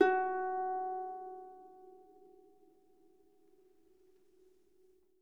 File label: Harp F#4; Miroslav Vitous - Percussion & Harp